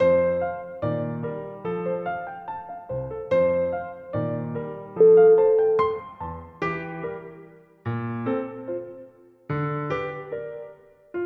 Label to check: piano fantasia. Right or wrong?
Right